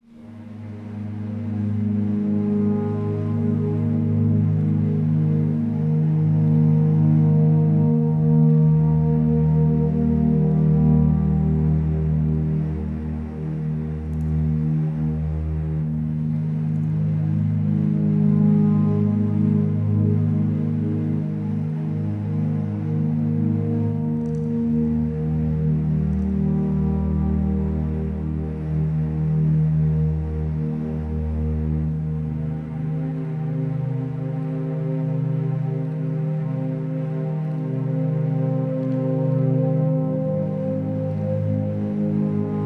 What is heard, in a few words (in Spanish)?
Sonidos de la Naturaleza, A Rainy Afternoon